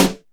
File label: JAZZ HIT, SNARES; Back In Time Records; Big Bang